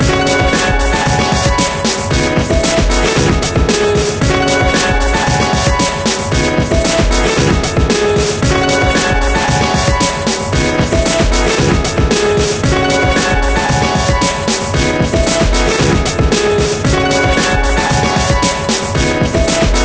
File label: Piano